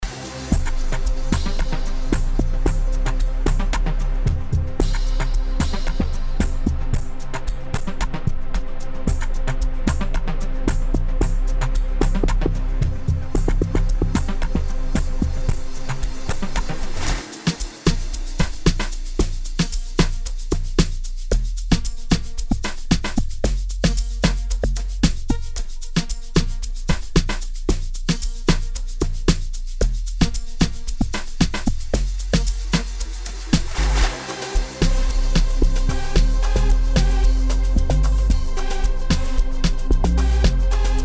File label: Piano Loop; Kokota; amapiano; sliquejams